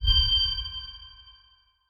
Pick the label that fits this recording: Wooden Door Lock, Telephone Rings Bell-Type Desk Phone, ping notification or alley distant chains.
ping notification